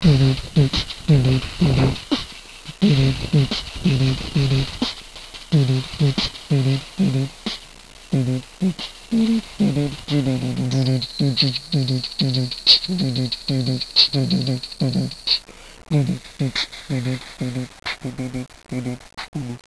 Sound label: music